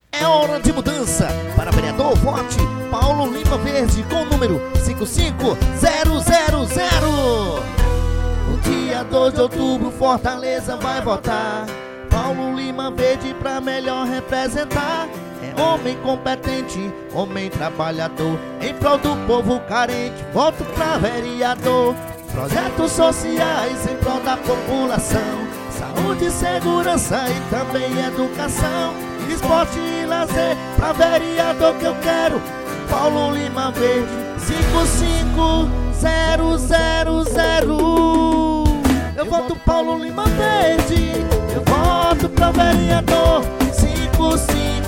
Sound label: JINGLE; paulo lima verde